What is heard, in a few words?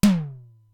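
Simmons SDS-9 high tom, Drum Machines; Factory D2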